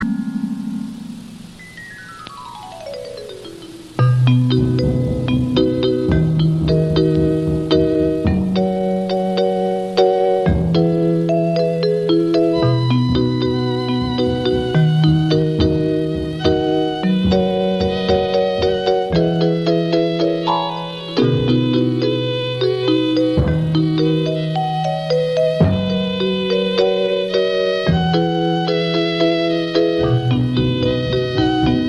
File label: Instrumental